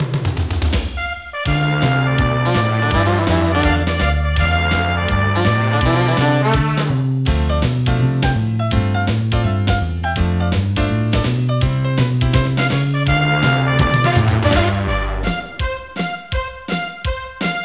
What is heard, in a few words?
8bit show tune